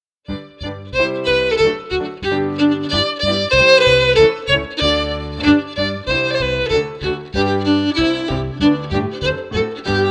FIDDLE PIANO; Jink & Diddle Book o' Tunes 2022

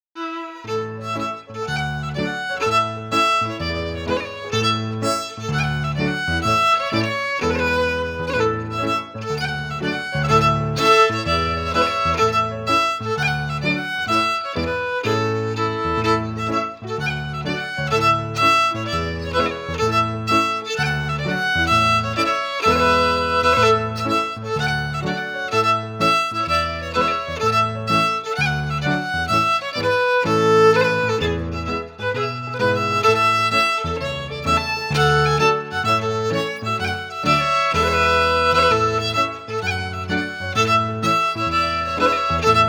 FIDDLE PIANO; Jink & Diddle Book o' Tunes 2022

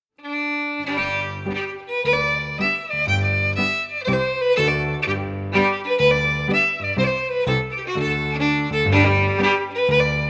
Reel FIDDLE PIANO; Jink & Diddle Book o' Tunes 2022

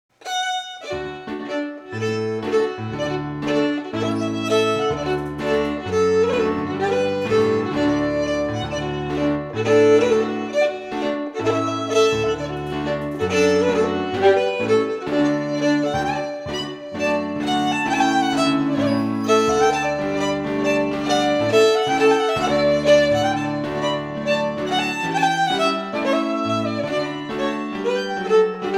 Strathspey, Scottish Fiddling; Macglashan col 1796

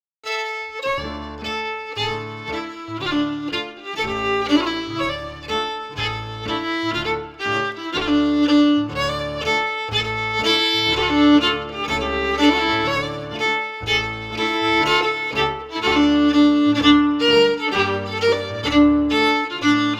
FIDDLE PIANO; Jink & Diddle Book o' Tunes 2022

